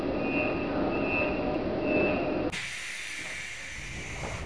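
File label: Door Close, Train; H-Start 426 Siemens Desiro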